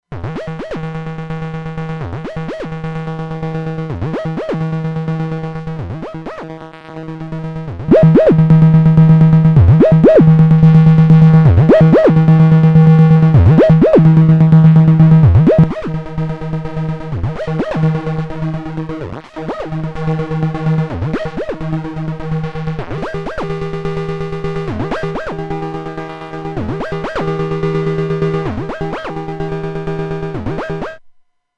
Acid8 MKIII 4x Square PWM

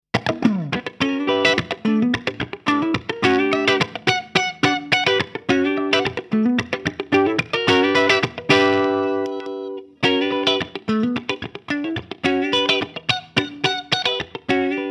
Epiphone 1961 Les Paul Clean

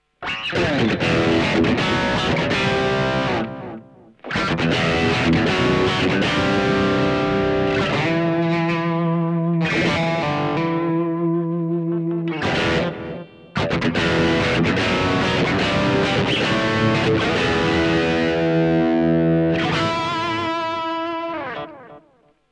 Blackstar LT Echo 15

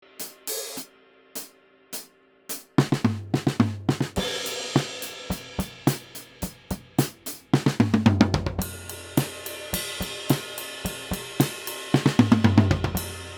Neumann KM184 Drums OH 1 Meter

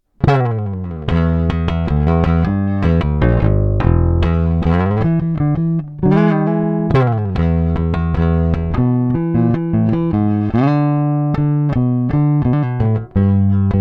Preci Thump neutral DI

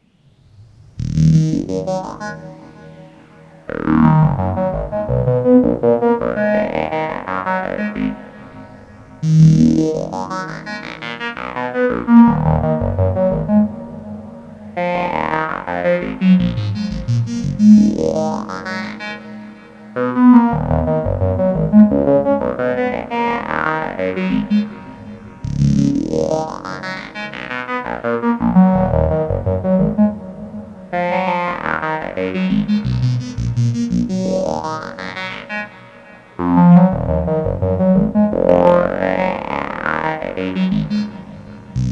performer triple reso

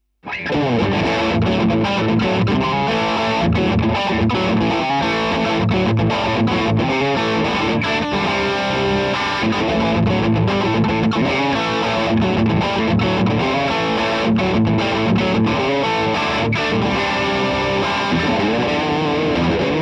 Gain Riff

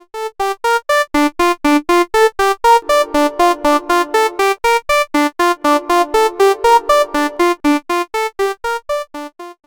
Formant Modulation; Waldorf Kyra

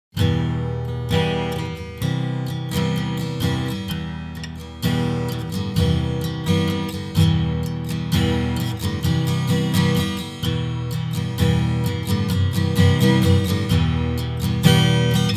Open Chords In Minor

